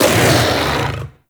Kubrow Armored Vocal Attack Medium; Warframe

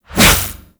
Briefcase Scythe Whoosh; Warframe; Corpus

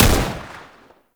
AK47 Fire; Warframe; Tenno

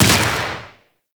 Projectile Explosion; Enemies; Abilities; Warframe; Sentient; Brachiolyst

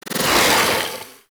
Teleport Out

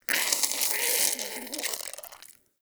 Wheeze Close, Infested; Ambience; Warframe; Infested Eris

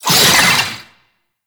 Oraxia Brood Cast Vocal; Warframe

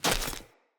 default step, steps; Characters; Ambient; Sprout Farmers

